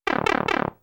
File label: Bubble Disappear; Salem's Sound Schemes